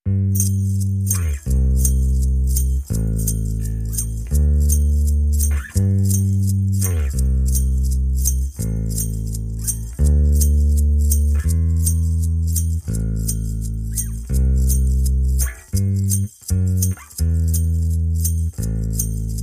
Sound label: Drums and Bass Mix